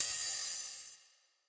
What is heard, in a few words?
stretchy hh